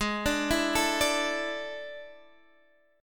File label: D Suspended 2nd Ab; arpeggiated